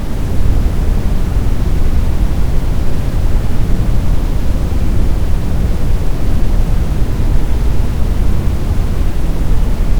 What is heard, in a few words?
Noise; Blind Survival